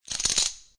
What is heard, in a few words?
Poker Chips Stacking Chips In Hand; Gambling; Casinos